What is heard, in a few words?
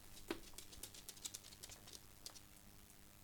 Dog Footsteps